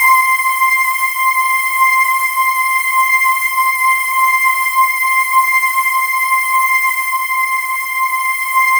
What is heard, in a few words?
C6, Synth Leads; 90 s Sample CDs; NiceBeats (NiceBytes); Studioline 09